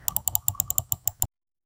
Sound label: mouse click sound